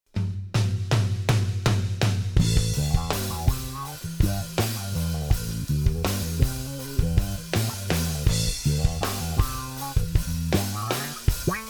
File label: 3D Auto Wah